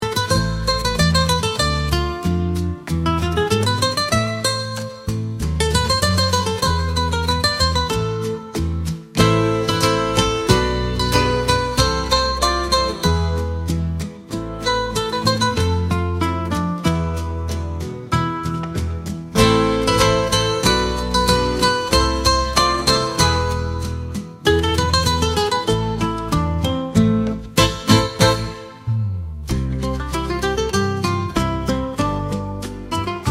whispers of the spanish guitar

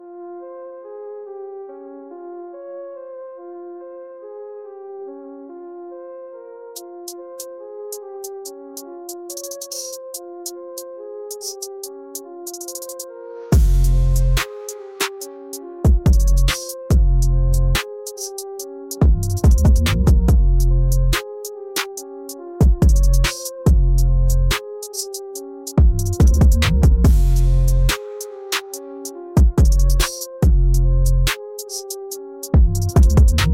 frenzied bass assault

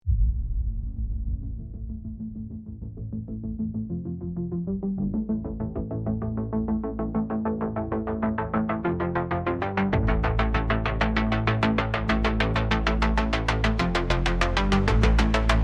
neon shadows, agressivnaya

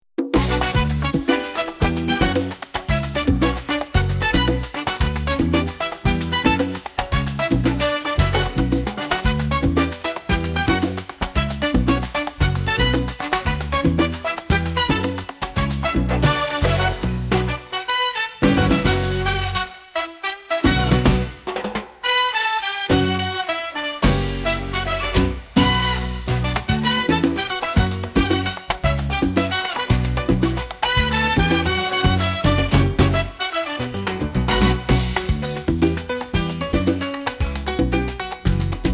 african night fiesta

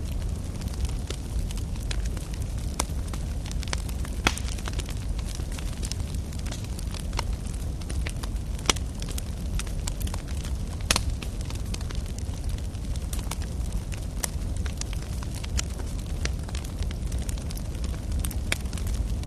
campfire

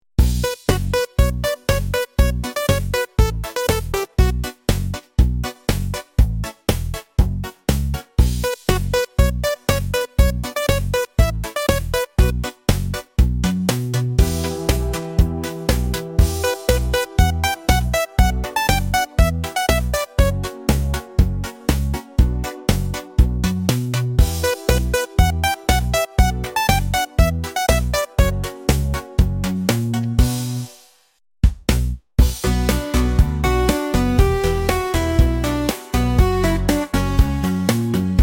sunlit grooves, afrikanskaya

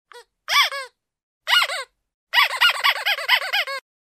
the sound of a rubber toy squeak

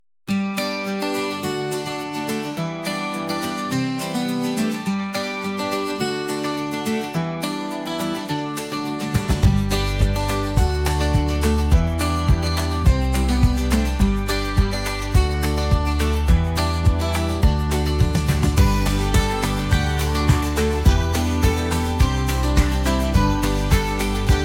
creative workshop, detskaya muzyika

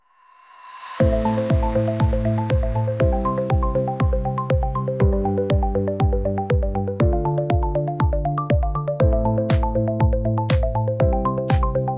tech pulse

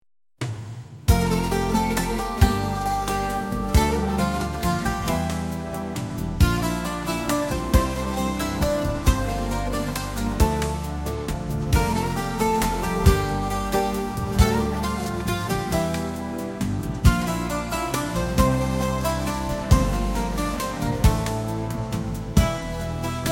anatolian fusion

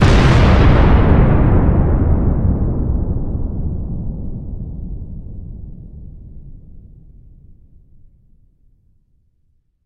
strong rolling explosion

